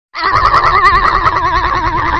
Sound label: anime ahhhhhhhghgh